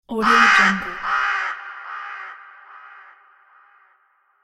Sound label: crow